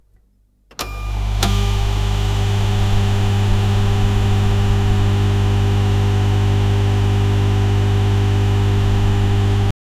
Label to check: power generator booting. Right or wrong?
Right